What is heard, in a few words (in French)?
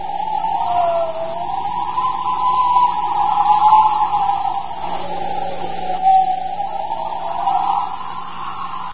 vent, ambience